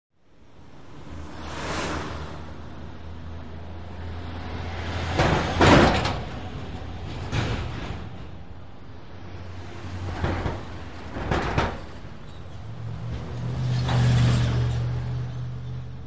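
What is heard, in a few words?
Light Traffic By Close, car & truck bys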